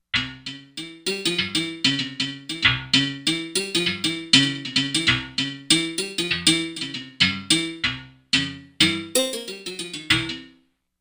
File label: Metal Sant, Korg MSC-13S Ethnic